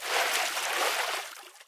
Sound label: wade, footsteps; Source Engine; video game